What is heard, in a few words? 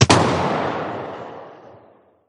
nova distant; weapons; Counter Strike Model Pack; CS2